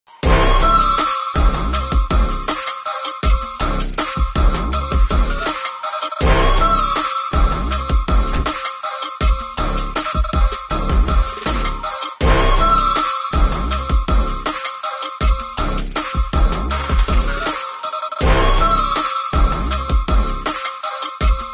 bgm ringtone super bgm; manadu

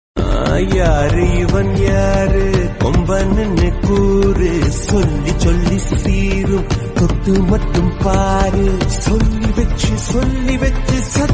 bgm ringtone Tamil

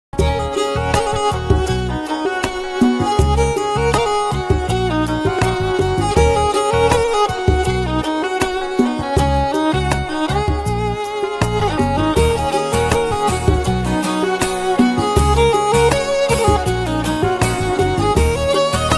melody bgm piano music

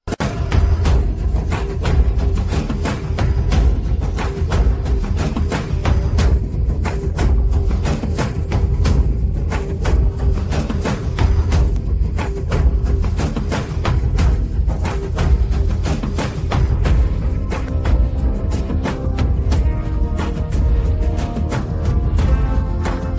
nayak bgm ringtone; kanguva; bobby deol

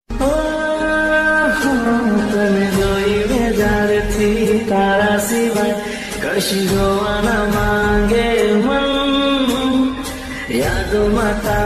new song ringtone gujarati ringtone

